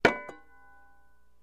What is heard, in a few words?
Axe Hits Shield